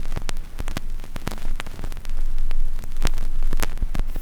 X CRCK L, RECORD-FX; XP RECORD-FX; Best Service; XXL Production Effects

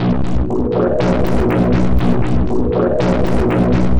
Synth Distorted; Electrocution

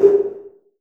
HC808, 808 CONSTRUCT; Masterbits - TR-Minator

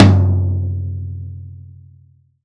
J T 3 FFF, TOM JAZ

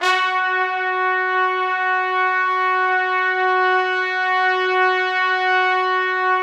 F#3, Orchestral Brass; Best Service ProSamples vol.20; AKAI

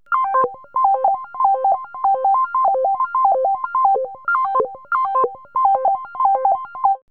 ARPEGGIO

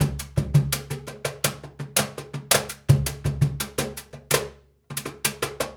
Cajun, Percussion, Drums; Essential Percussion

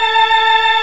Barton organ; Barton Melo L+R

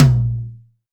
TOM, Drums; Best Service; Real Mega Drums VOL-1

